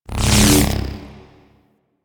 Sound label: Ground Laser Retract; Enemies; Abilities; Warframe; Teralyst; Bigalyst